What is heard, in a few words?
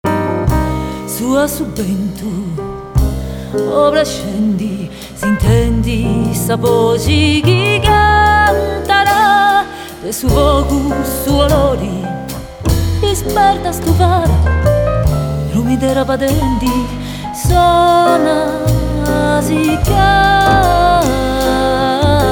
Cantus Antigus